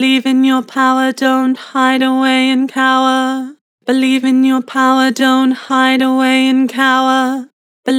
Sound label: Vibrato, Vocals; Believe in your power; Harmor